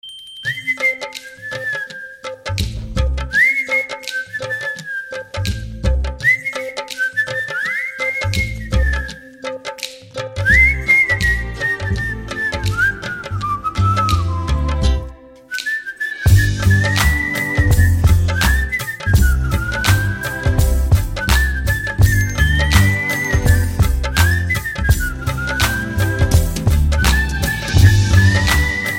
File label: Perfect whistling